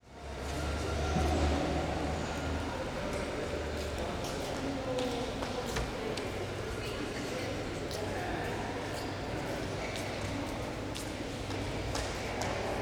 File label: Reverb Train Station